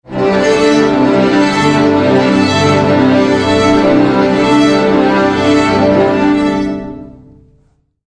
orchestra strings warm up; Orchestral

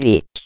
beat; wow

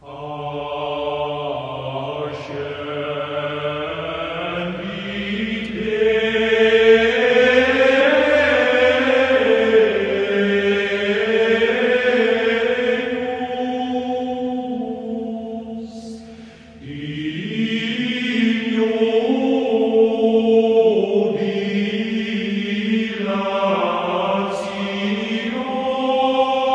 gregorian, MALE SINGING

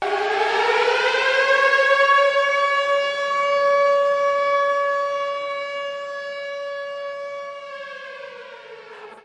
air raid siren fadeout; Sound FX Real Life

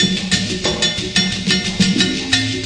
afro cuban relaxed, 090 bpm, drum loop

